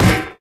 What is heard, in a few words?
physics turret impact lg